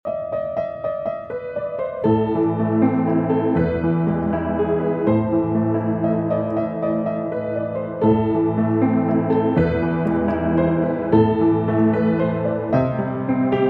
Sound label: Piano